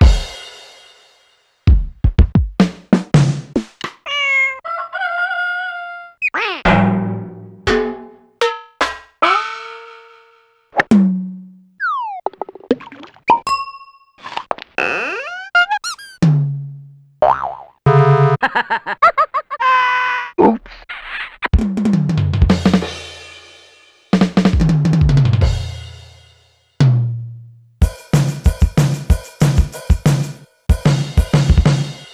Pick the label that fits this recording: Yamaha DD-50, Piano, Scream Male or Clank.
Yamaha DD-50